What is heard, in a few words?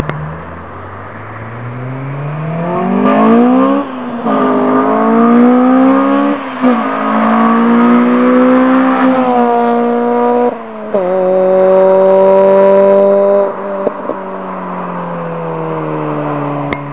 drive by, bil